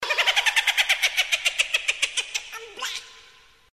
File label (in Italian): risata